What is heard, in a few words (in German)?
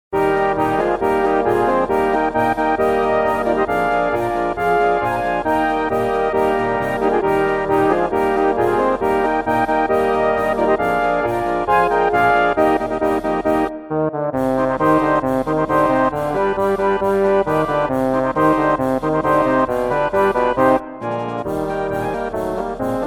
Bergler Polka